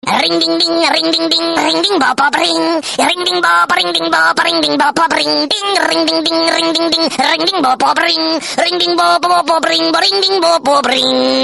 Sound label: Jingle Bells